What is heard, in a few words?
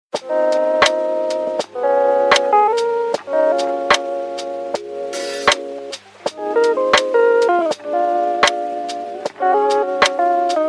Lofi chill